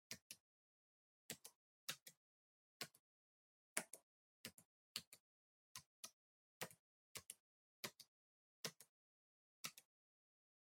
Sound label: PC Keyboard Far Gentle